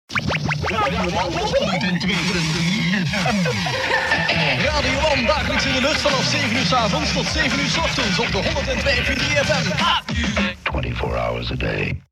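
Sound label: Jingle; ONE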